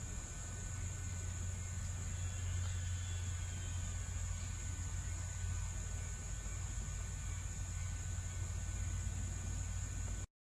Empidonax flaviventris Yellow-bellied Flycatcher, Veracruz; Mosquero Ventriamarillo; Moucherolle à ventre jaune